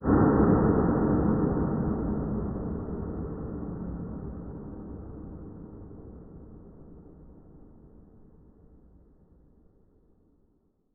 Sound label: heavy steel noise